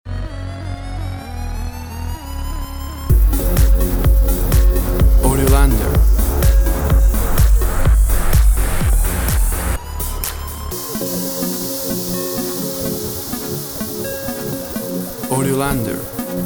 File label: Top 40 Electronic Dance Music